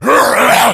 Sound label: rage at victim, infected, male; Left 4 Dead 2; Source Engine